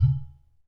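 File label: UDU RELEASE, African Perc; E-MU Formula 4000 Series Vol. 4; Earth Tones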